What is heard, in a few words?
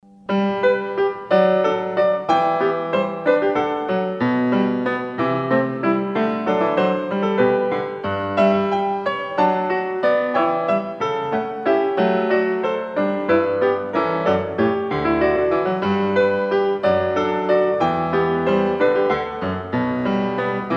Bach Goldberg Variations